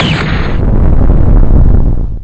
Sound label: Lightning